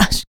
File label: HIT, Jazz FX FEM PERC